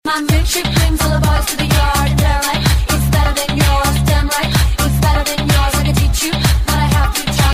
House Mix

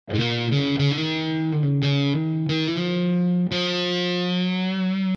click

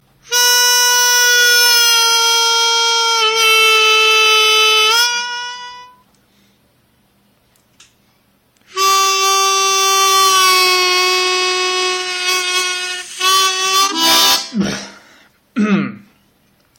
full bend fail C

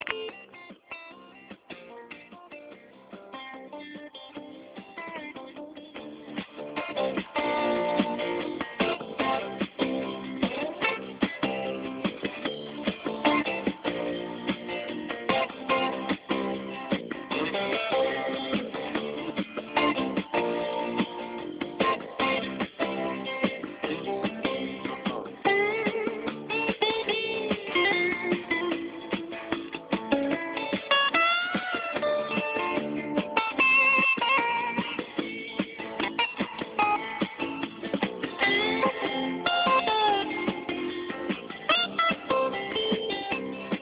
Pop-rock '80

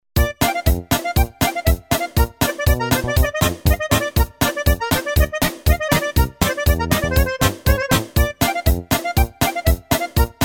PS Fretted Guild kontra

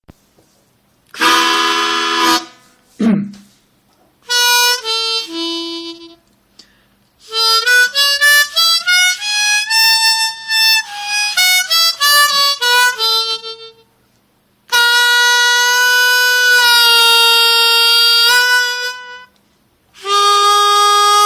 half bend fail A

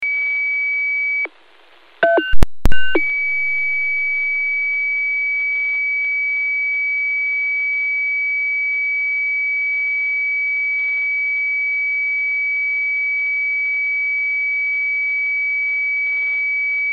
scan

